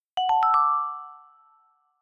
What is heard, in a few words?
tone